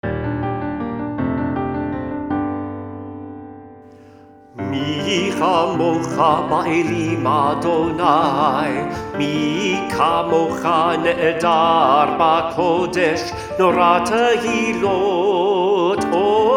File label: LOW VOICE; Mi Khamokha